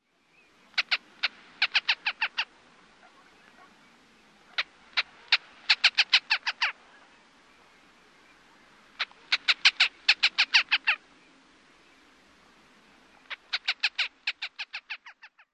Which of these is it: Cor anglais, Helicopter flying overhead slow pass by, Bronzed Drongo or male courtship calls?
male courtship calls